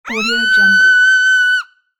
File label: cartoon scream